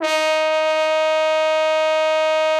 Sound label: TROMBONE, Killer Horns; Best Service